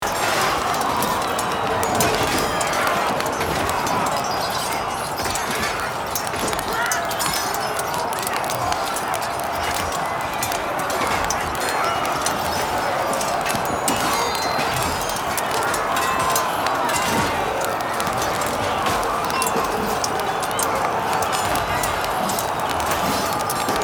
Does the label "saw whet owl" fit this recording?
No